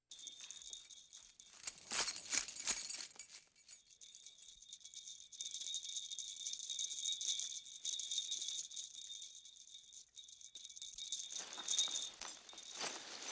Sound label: Bells Jingle Sleigh Be; Universal SFX